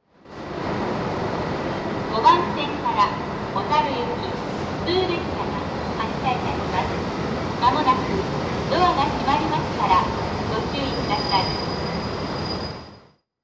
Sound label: sapporo departure, station; jrhokkaido